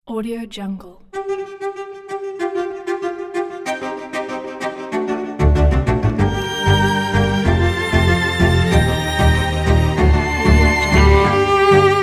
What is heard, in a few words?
modern energetic cellos violins; eddiehoncha